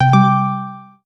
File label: NewMessage